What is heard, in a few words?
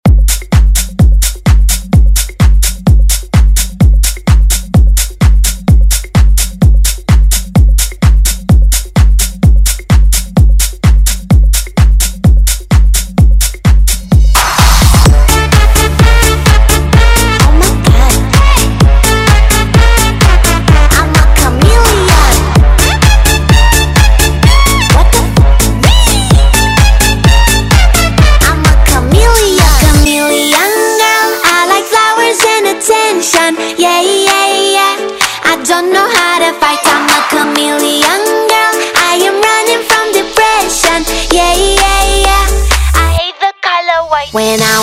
Pop Dance Redrum